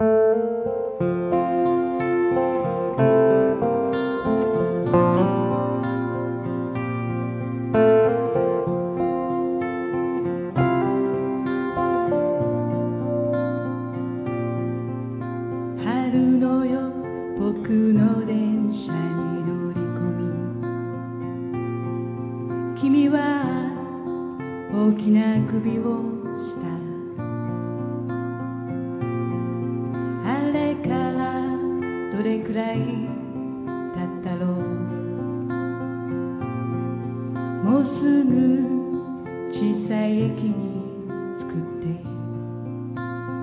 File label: folksong